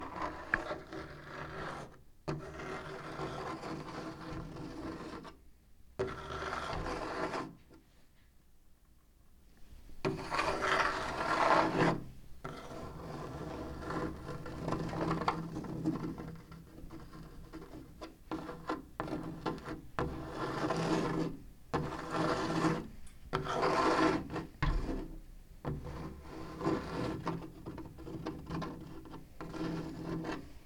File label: Scratching